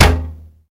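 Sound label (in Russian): падение снаряда после выстрела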